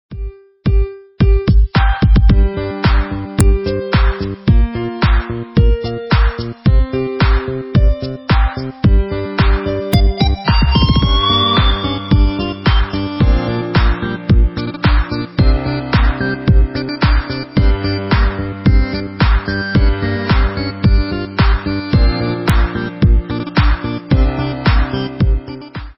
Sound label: sound disco; solvable-sheep-game; bgm